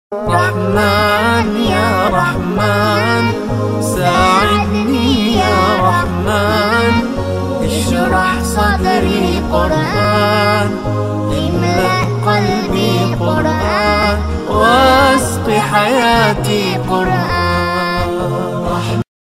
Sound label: Islamic Naat; ringtones